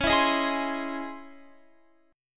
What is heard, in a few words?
C sharp Minor Major 9th Guitar; C#mM9; strummed; x,16,16,20,20,20